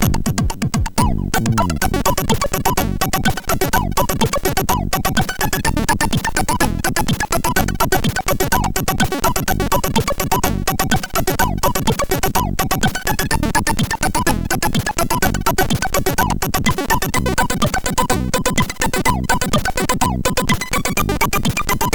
MOS6581R4; hvsc

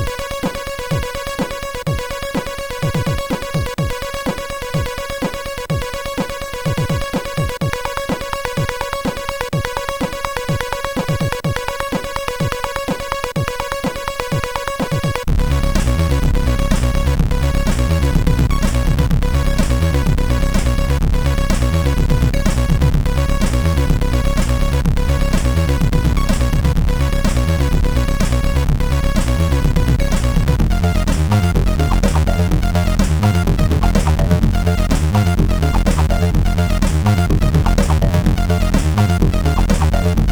MOS 6581 R4; Old Action

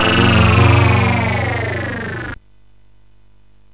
Tube, MOS6581R4

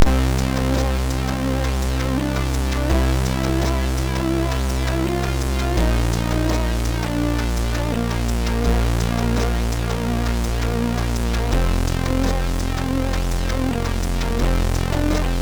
Takt, MOS6581R2

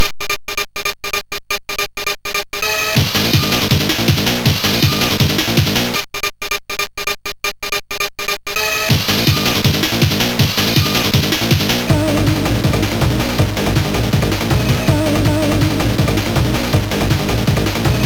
tune sid MOS6581R2; Technotica tune 2

